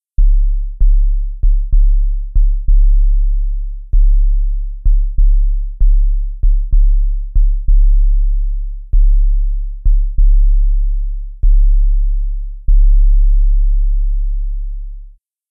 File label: Neutron @ 35hz; Maximum Bass